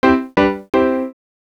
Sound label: piano noise